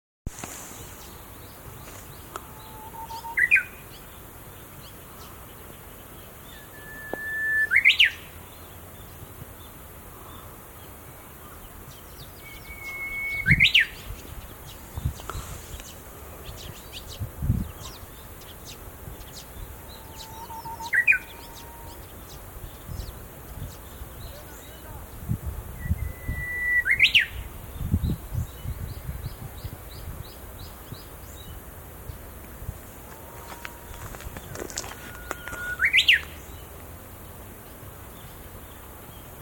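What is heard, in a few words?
uguisu; sanpomichi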